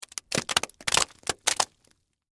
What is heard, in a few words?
Splat Debris Juicy, Long; Texture; Splats (Sound Effects:Objects); BlastWave